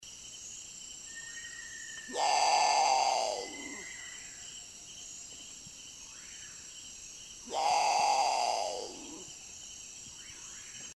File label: Great Potoo sound Nyctibius grandis